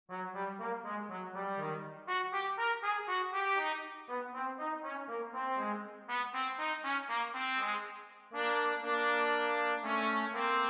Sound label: trumpet and trombone duet; Mario and Luigi